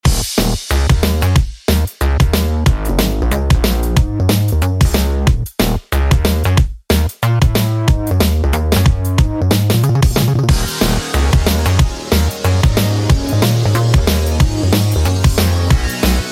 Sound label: waltz; Verdict Guilty